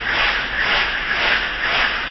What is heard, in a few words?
steam engine; techage